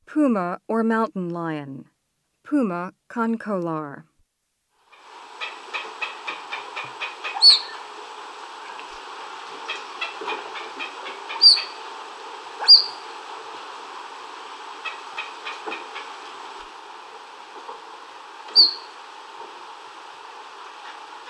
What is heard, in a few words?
Puma; S. America